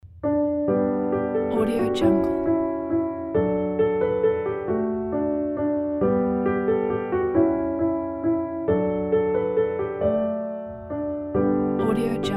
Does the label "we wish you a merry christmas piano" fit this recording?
Yes